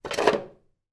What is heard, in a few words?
toilet brush remove from plastic holder